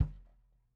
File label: Kick, drum